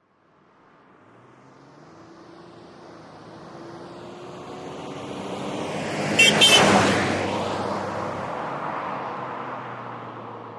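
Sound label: Montero By Fast Horn, 1998 mitsubishi montero ext.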